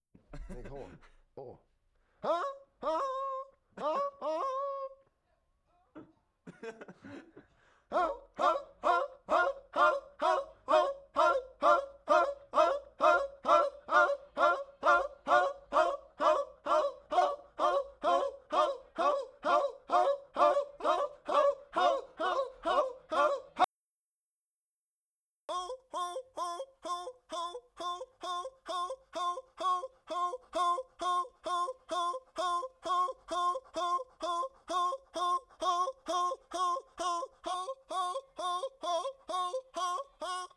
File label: Vocal Seals Intro